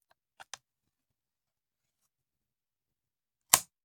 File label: Machine Gun Foley; Sound Ideas; Guns